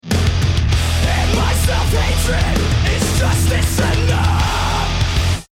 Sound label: lost round